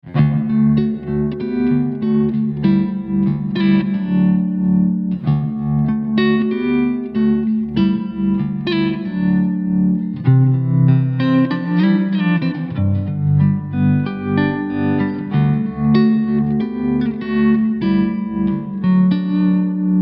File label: Warm Tube Trem; Strymon Riverside → Strymon Iridium → Strymon Flint